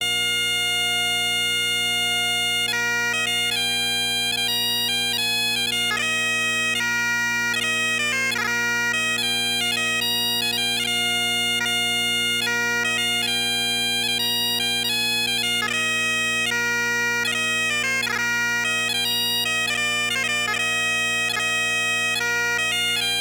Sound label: Highland Gathering Drones; Bathgate